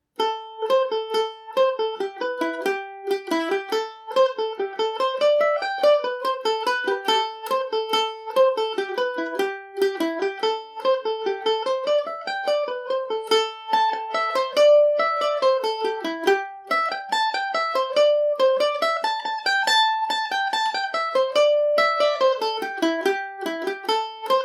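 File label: A minor, Irish mandolin; castle kelly revisited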